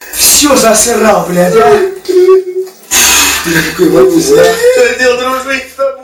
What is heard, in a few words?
fart; pvkii